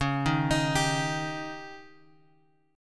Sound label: C sharp Suspended 2nd Flat 5th C sharp, chord; C#sus2b5 Chord {X 4 1 X 4 3} arpeggiated